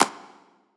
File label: clap one shot hands; Pop; Drums; Slate; SD PASADENA Pop Sample Pack